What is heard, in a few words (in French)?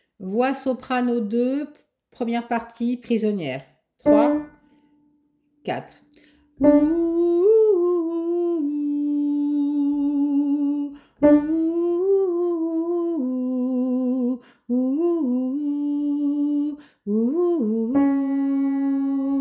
voix soprano